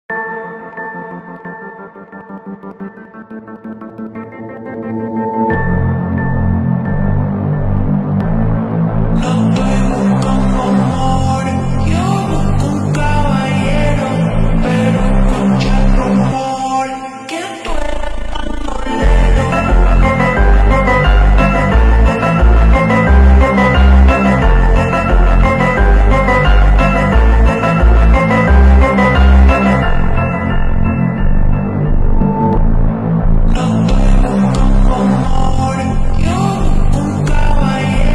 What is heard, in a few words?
Phonk Slowed